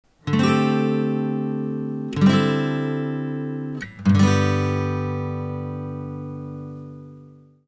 sus4th dominant; fretjam

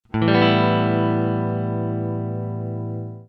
a7sus2 open; fretjam

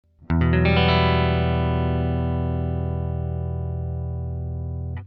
em add9 open; fretjam

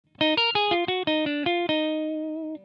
alt pick

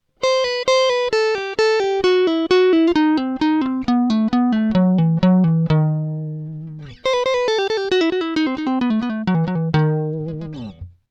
pull off; fretjam

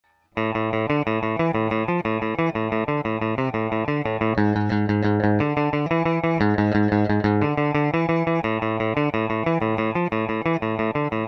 fast pick; fretjam

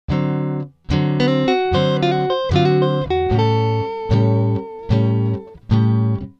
4 1 chord arp; fretjam

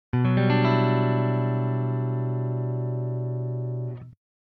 b minor float; fretjam

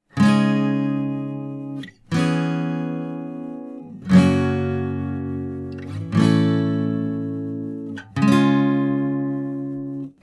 barre

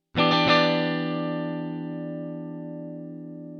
alt minor 7th a; fretjam

